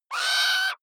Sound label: owl shriek; natur tiere; voegel